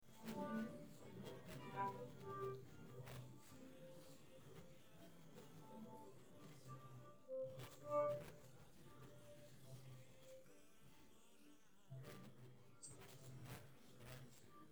no vocals

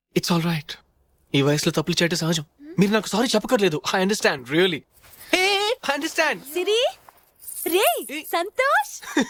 happy vocals

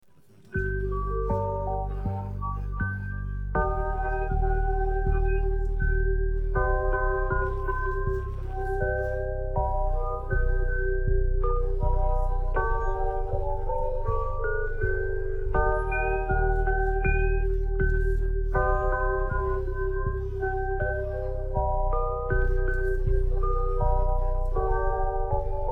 no vocals

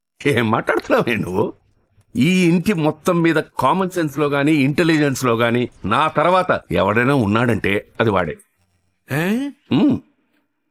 happy vocals

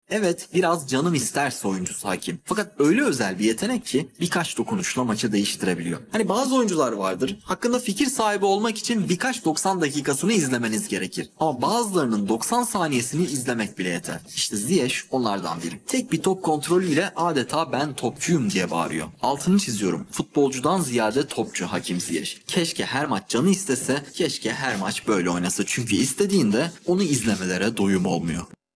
vocals

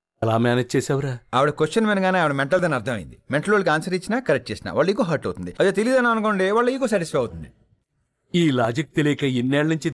happy vocals

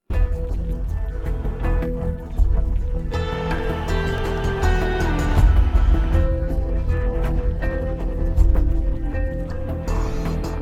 sad no vocals

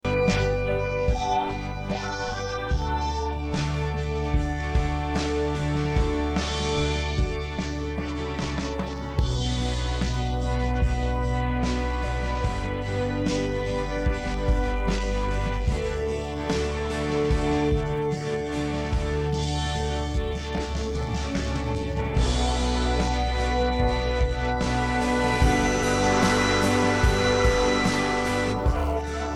no vocals

